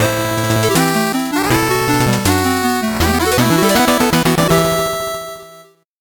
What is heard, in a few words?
8bit jingle fast